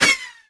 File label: whizz; monkey isle3